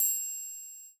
triangle, Percs; 2009 triangle

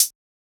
hihat

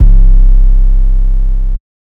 808 Dist; Spinz; The Lunchbox - Lunch77 (Shows the Screen) Drumkit; 808s

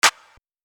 Clap

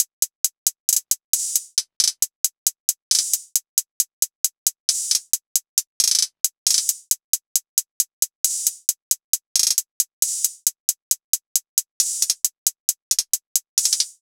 beat loop hihat 135; cash

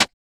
Clap

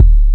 Kicks

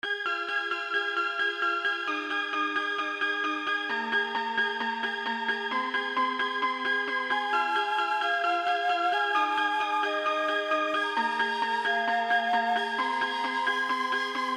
130 BPM

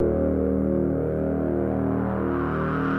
Bass; 808s

